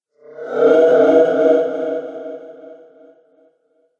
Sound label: Haunting Magic Spell; Haunting Magic Spell 1; Ghost Sound Effects; Andrew Fleming